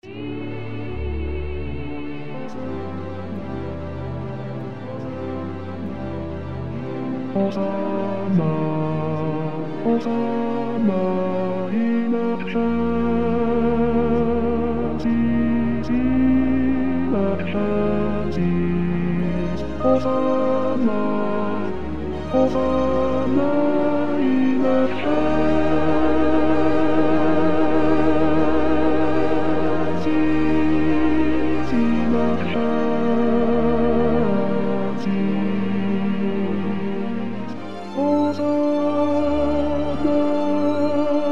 sanctus bass

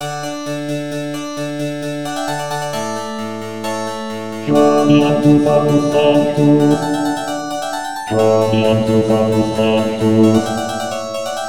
bass; quoniam

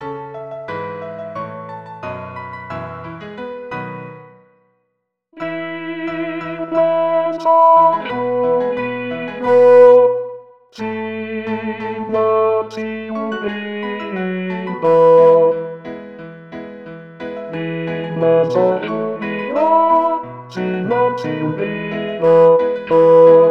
tenors d immenso giubilo

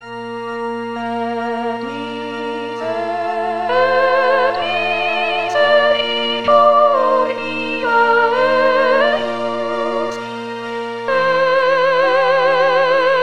sop et misericor

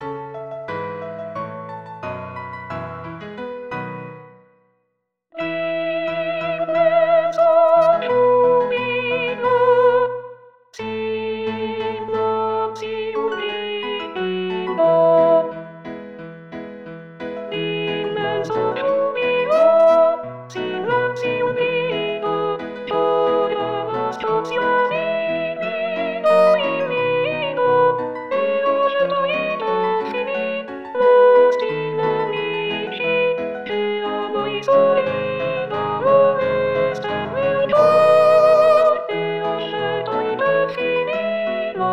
soprane d'immenso giubilo

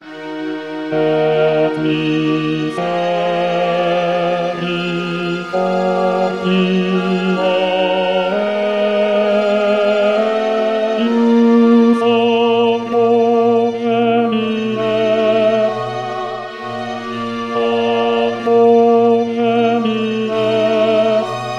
bass et misericor

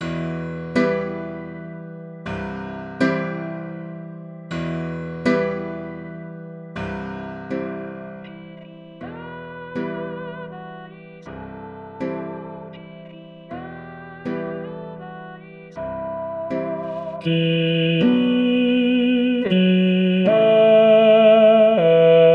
bass kyrie

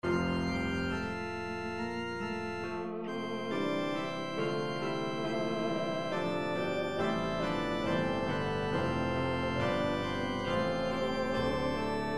durufle kyrie sop

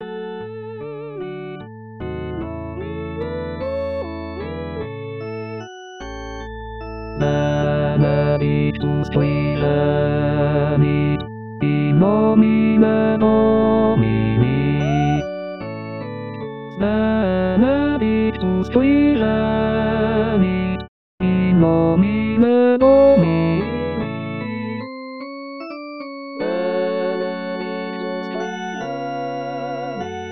bass benedictus

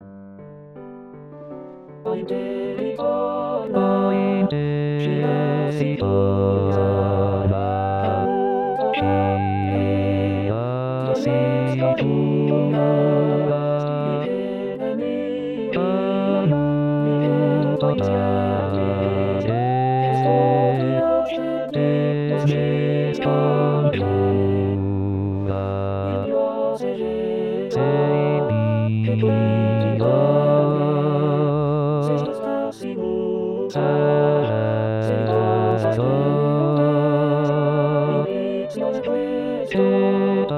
norma basse; BARYTONS ET BASSES

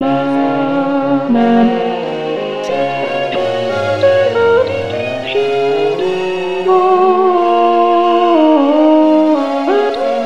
alt sicut

